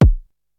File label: big room kick single shot a key; kick drum samples